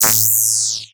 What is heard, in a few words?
Digital; Adobe; Multimedia Internet CD-Rom Flash